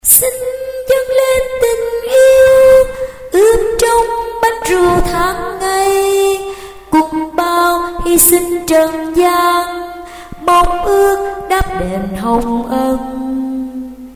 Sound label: sop alto